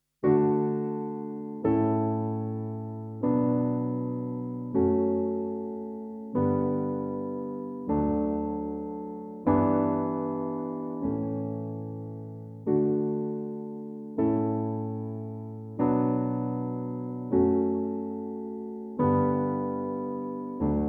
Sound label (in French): Canon de Pachelbel